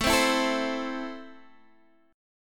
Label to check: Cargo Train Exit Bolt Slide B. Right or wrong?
Wrong